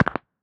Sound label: Muffled snap